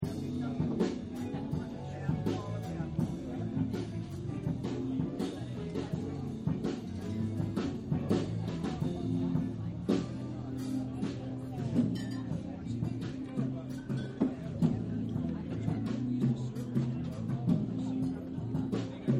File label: Nightclub Music Amb Loop, Bars And Clubs; Backgrounds